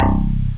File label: funk bass; da capo vol1; instruments; synth; chords